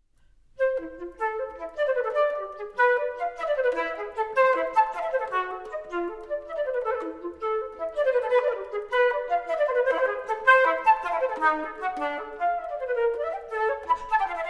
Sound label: suite II, flute, guitar